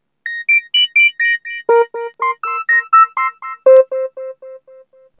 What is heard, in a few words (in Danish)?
sms lyd